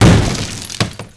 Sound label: hit ston; WhiteOut